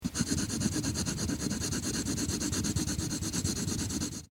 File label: Coloring In Pencil On Paper; Paper Sound Effects